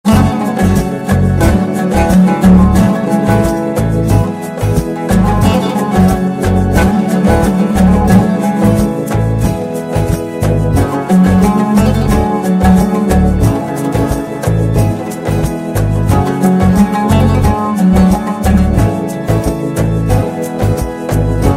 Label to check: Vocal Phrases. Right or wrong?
Wrong